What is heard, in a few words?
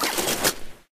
taunt jackhammer out; video games; source engine; tf